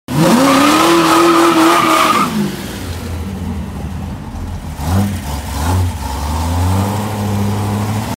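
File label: Pontiac, exhaust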